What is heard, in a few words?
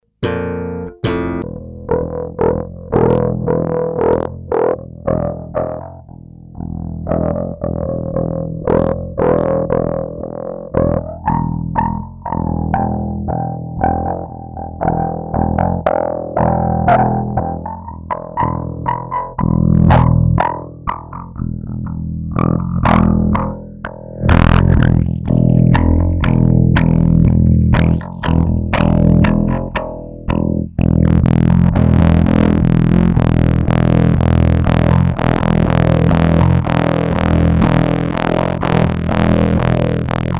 Bass deep N dirty; Plague Bearer